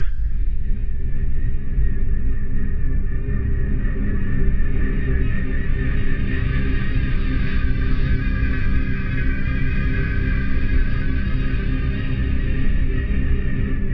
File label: Atmos Quasselstrippe, Synth; Loops; Tonal; Ableton Live 12 Lite; Core Library